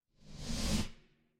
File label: ui swipe